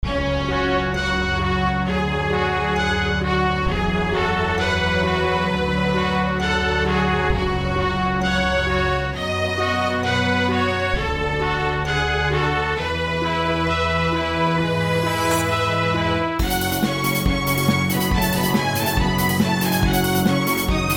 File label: Choir Strings Brass; Castlevania OoE; Magnus Choir; Syntheway